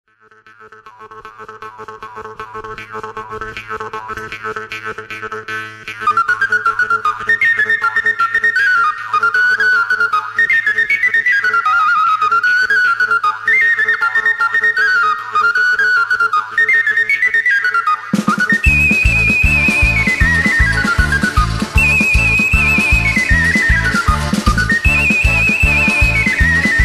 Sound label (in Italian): Tarantella Missinisa